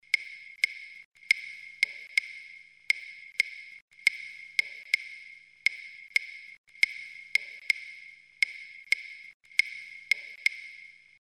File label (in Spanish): clave rumba 2-3; Interpretación musical para bailarines; ESCUCHAR: CLAVE RUMBA 2-3